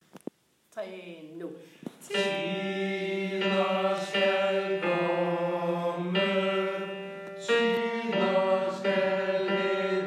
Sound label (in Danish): dejlig er jorden tenor